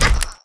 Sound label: Death Highfall; Lara; Playable Tutorial Level